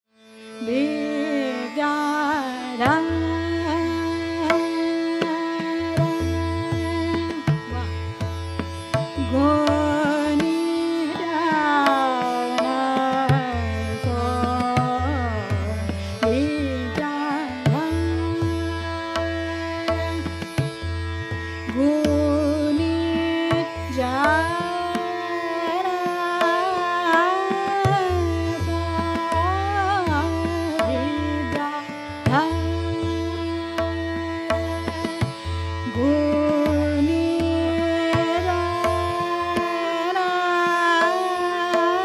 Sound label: Khat